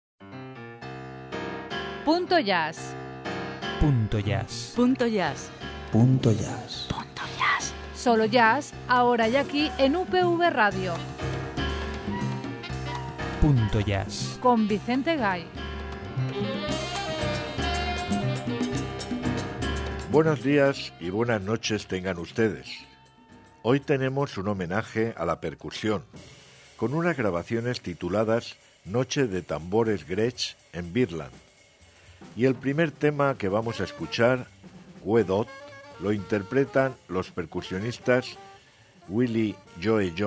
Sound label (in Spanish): Noche de tambores; Punto Jazz; Noche de tambores en Birdland